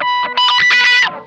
OVER WAH, Funk Guitar; 90 s Sample CDs; Zero G

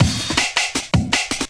LOOP L, Drum & Bass 160 BPM

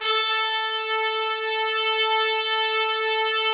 Trumpets; Roland - LA Composer vol. 4

